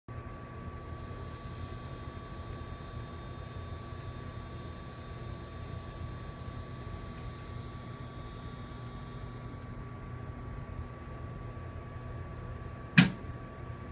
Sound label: otis elevator machine room